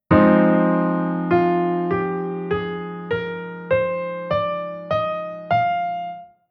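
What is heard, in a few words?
Chord Scale Minor Natural Minor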